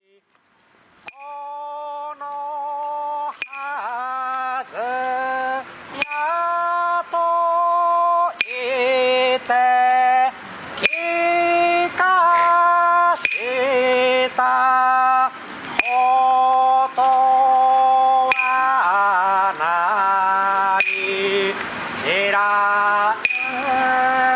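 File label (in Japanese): hyoushigi no oto; MAEBASI-S-MAE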